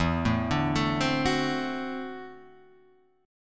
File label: D 9th E, guitar; arpeggiated; JGuitar